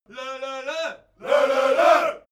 army song segment

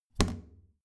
plastic trash can close heavy

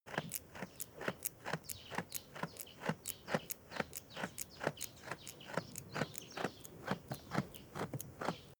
goat chew

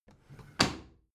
bathroom drawer close regular

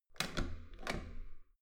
metal door heavy handle use

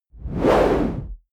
whoosh noise sweep hit low long

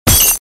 short glass break

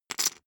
poker chip stack small rattle

